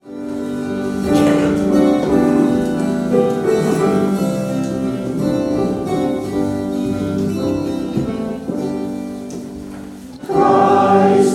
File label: music group